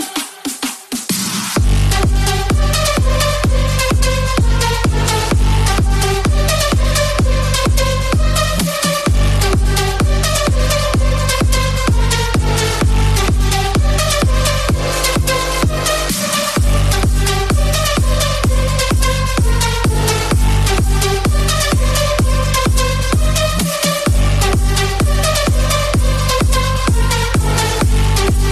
heavy metal

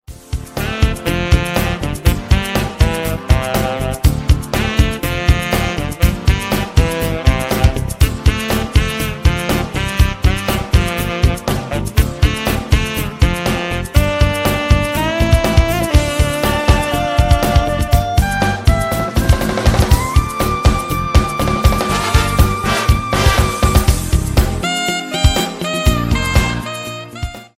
classic melody ringtone

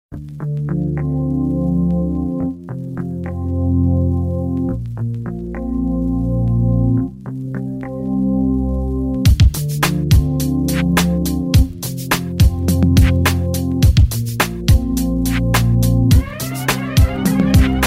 lovely romantic background music tone

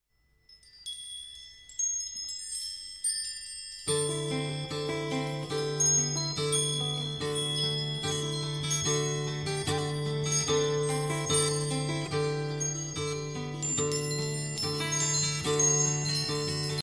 best romantic ringtone; zedge

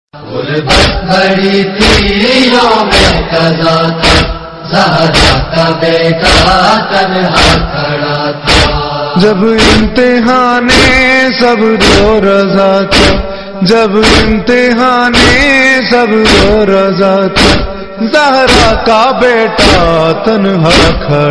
noha tune ringtone

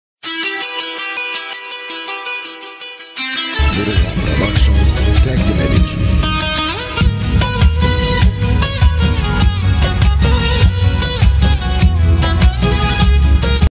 new sad music galaxy ringtone

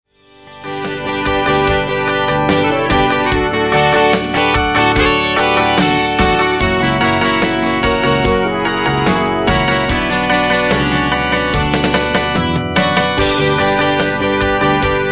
instrumental ringtone

